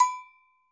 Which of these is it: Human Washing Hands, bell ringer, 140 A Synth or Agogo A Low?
Agogo A Low